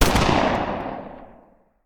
Hand Gun; Sound Ideas; Guns

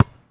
Street Ball Bounce Dirt, Street Basketball; SFX Kit

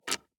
DICE on Hard Wood, Grab, Standard, 4 Four Dice; Samples; ArticulatedSounds; DICE Sfx Party (Extended)

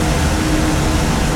Machine Dynamo Loop; Machinery - Generators

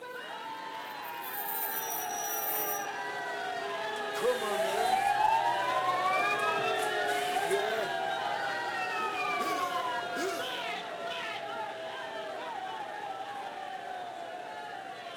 Crowd Noises Jeering; Pitch Black Audio Pong

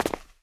concrete land; firefight

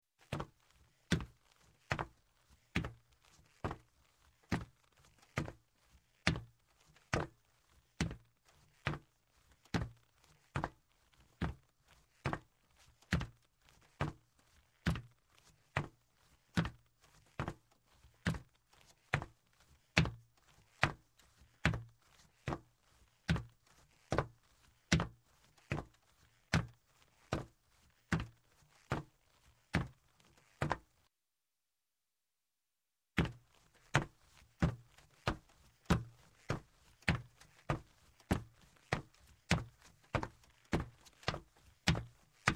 Footsteps, Metal - Sneakers; Sound Ideas 2000 Series

